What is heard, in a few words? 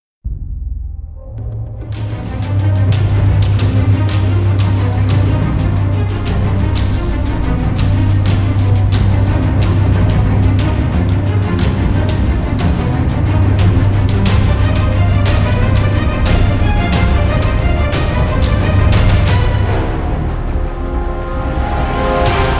Taiko, Tension